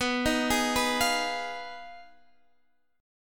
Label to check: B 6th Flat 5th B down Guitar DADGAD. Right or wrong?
Right